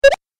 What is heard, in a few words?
Retro Pong Tennis Hit; Video Game Sound Effects